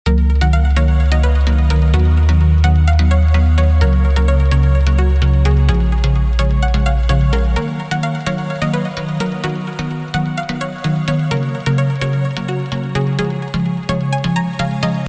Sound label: Soothing ringtone